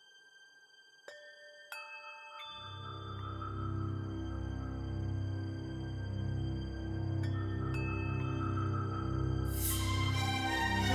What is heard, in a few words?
Music Stem